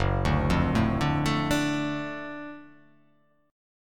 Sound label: Gb Minor Major 7th Sharp 5th; arpeggiated; 2,5,3,2,2,4,4,x; JGuitar